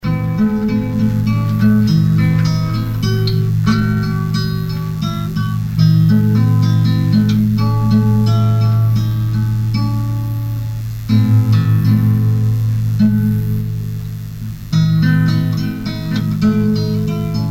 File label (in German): Inmitten dieser Welle akustisch